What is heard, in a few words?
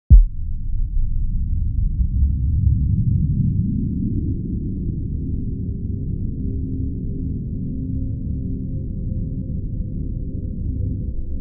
Hits; Ilusory